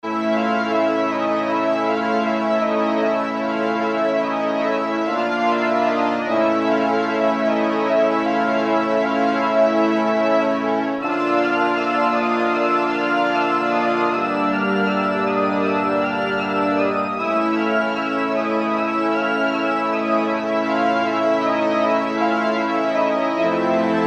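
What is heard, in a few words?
W.W. Ens, Woodwind & String; Woodwind & Strng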